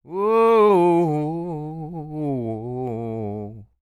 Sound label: SING, Blues Soul